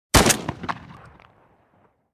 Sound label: Benelli M4 single shot